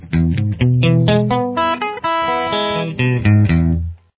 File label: Arpeggio E open, caged open arpeggio shapes guitar